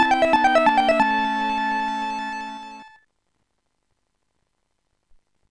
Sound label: you win; Sonix Music Driver